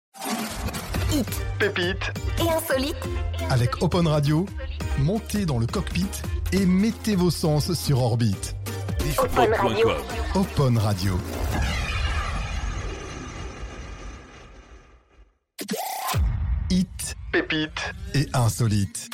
Radio Jingles; Opon

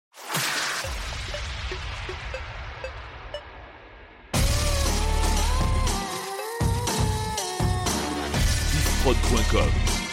Medley Anglo Vintage

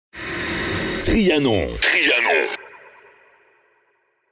Drop ID; Trianon

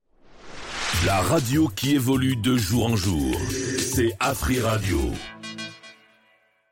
Sweep Radio; AfriRadio; Radio Evolue

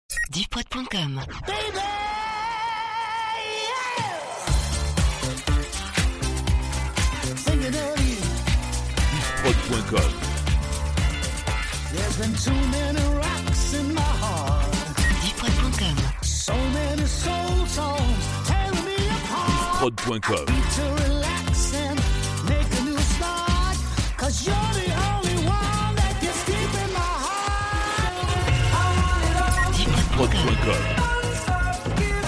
Medley Pop Rock Funk

